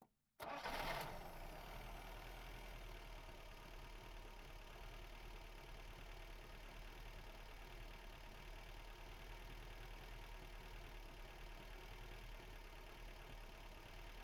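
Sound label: polonez 1500 onboard start idle steady blips off engine right, Police Car; FSO; Pole Position; PZM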